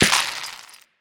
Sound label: Chess Pieces Hitting Wooden Board